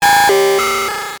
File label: Title Transition Data Transmission Computer; Adobe; Production Element